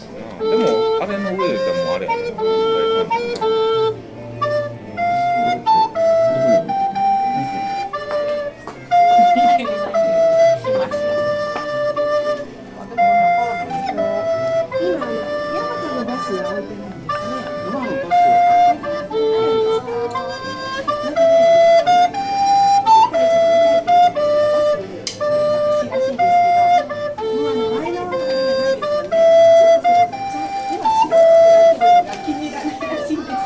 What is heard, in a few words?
recorder